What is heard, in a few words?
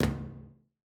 pipe land